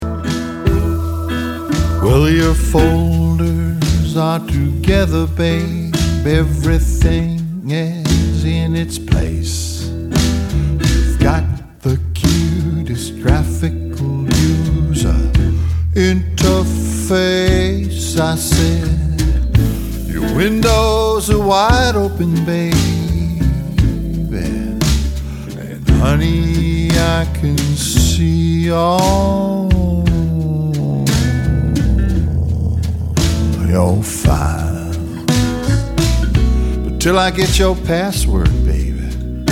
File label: Computer Blues Modern Muddy